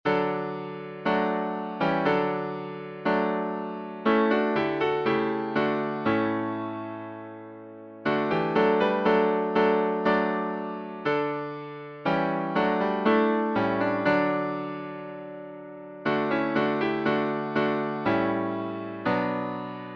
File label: Hymns